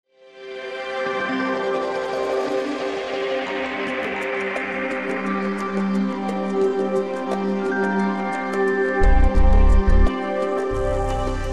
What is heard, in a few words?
ambient